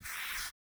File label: Soft Slide Medium